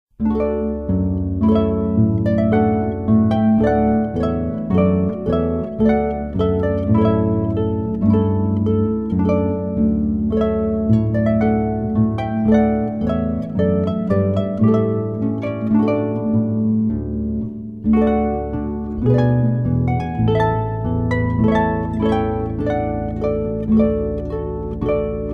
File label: trumpet voluntary